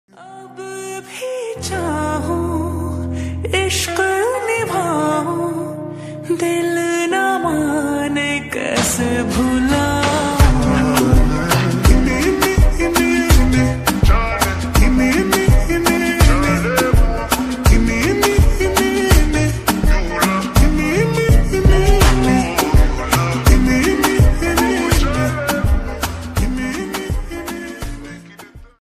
ringtone english x hindi ringtone

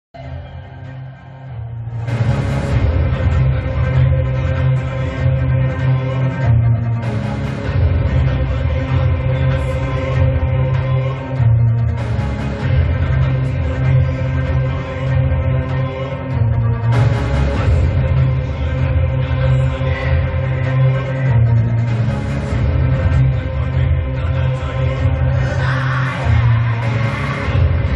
climax ringtone; ajayanterandammoshanam

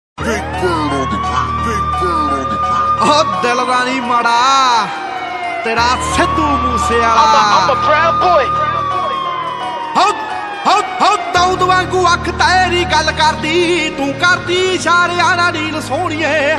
punjabi song ringtone

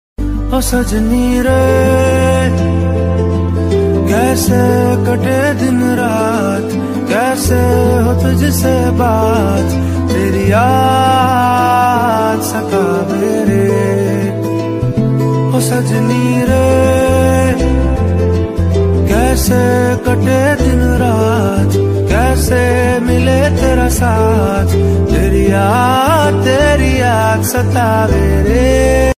slowed reverb lofi ringtone love ringtone

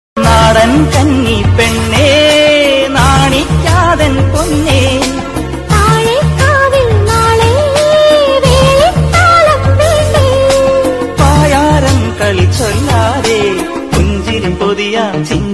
malayalam ringtone

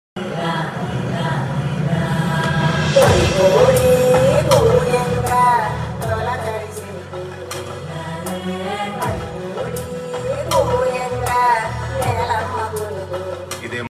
movie hero entry bgm ringtone

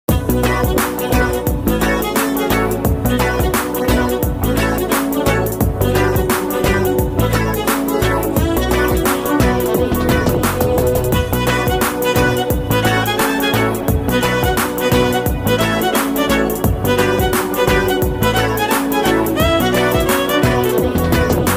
song bgm ringtone telugu bgm ringtones; ghani movie

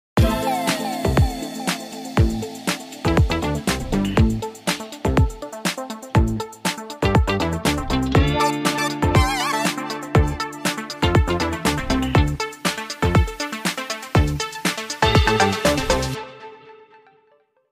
samsung galaxy s21 ringtone; dance

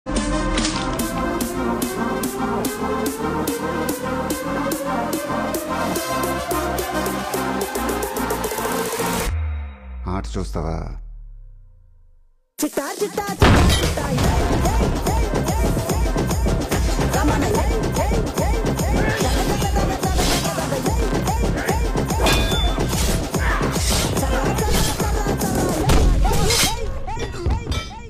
climax fight bgm; guntur karam

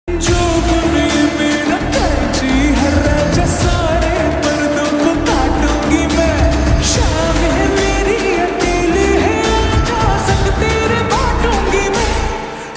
slowed reverb ringtone

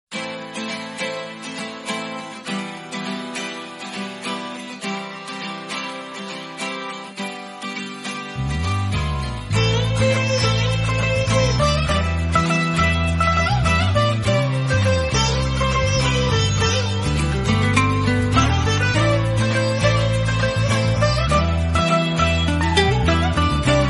song bgm instrumental tone; nagarjuna; anushka

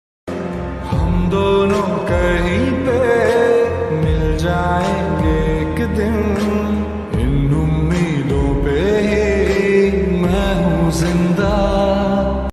sad slowed reverb lofi

